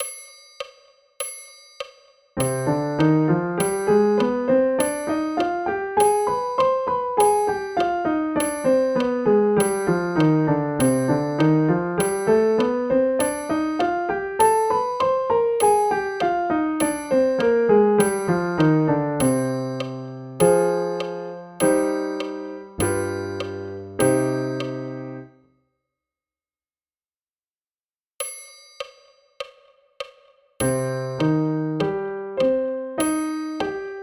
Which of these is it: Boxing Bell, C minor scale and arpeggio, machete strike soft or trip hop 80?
C minor scale and arpeggio